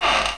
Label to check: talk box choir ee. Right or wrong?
Wrong